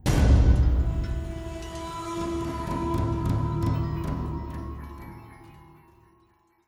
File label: Music Stinger; Hunted: The Demon's Forge; x360